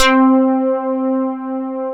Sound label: SYN, S CLAVI